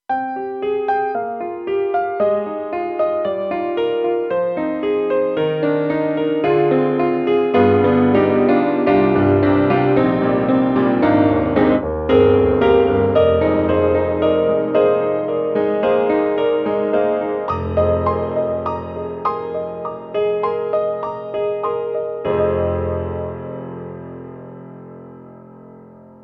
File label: KORG M3 XPanded Honkey Tonk Piano